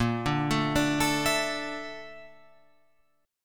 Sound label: Bb Major 7th Flat 5th, Guitar, Open F; arpeggiated; 10,9,9,9,12,11; BbM7b5